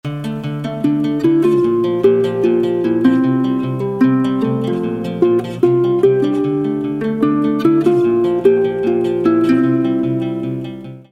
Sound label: lute